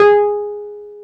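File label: Piano Wurly G#5; Keyboards of The 60's and 70's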